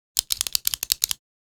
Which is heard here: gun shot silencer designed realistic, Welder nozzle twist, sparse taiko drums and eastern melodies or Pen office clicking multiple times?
Pen office clicking multiple times